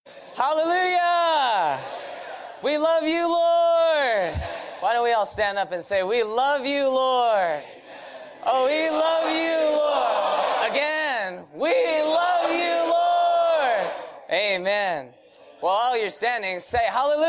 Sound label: Singing and Praising; Morning Revival